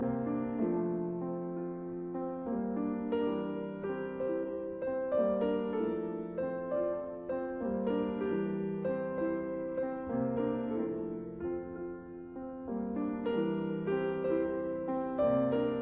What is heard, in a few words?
Pastoral lullaby; Caturla; Juana Zayas